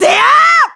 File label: Final Smash Shout; Toon Link; Link's Final Smash shouts (1st heard before the final slash, 2nd heard during); Zelda